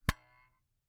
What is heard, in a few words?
Camera, Aimex SP500, point n shoot, plastic, knock; Eiravaein Works; Vaeyan IV